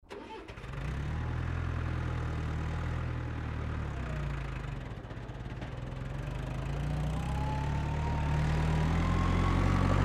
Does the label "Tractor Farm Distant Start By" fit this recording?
Yes